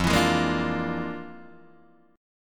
F Minor Major 13th, Guitar, DADGAD

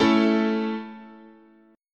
A Major, guitar chord

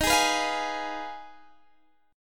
F Minor 7th Flat 5th D sharp

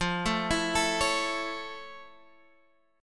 F Augmented Major 7th, Guitar, Half Step Down

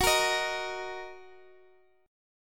Gb 6th Gb down Guitar Open D

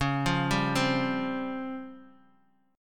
Db Major 7th Flat 5th, Guitar Open D

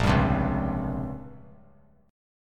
A sharp Minor 6th Add 9th A sharp down, chord; strummed

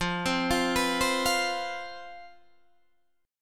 C Major 7th Suspended 4th, F, guitar chord

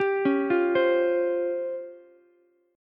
Db Major 7th Flat 5th, Ukulele; 12,13,13,15; DbM7b5 arpeggiated; JGuitar

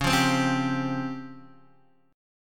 Db Major 7th Flat 5th, Guitar DADGAD

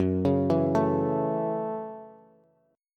F sharp Minor 7th F sharp, Bass

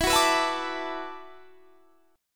E 7th Suspended 2nd Suspended 4th, down; E7sus2sus4; strummed

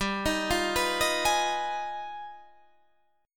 G 7th Flat 9th Sharp 5th

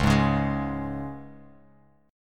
C sharp 5th, Bass Standard; strummed; C#5; 9,11,11,13; JGuitar

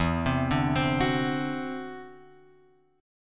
E 7th Suspended 2nd Sharp 5th, chord; E7sus2#5 Chord {0 3 0 X 1 2} arpeggiated

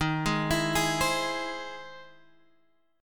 E Augmented Major 7th

